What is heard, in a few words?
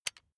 electric button drill direction switch backward heavy